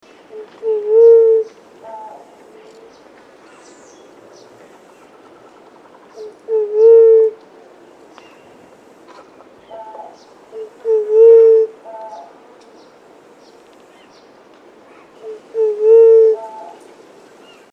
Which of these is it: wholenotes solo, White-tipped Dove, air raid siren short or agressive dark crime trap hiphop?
White-tipped Dove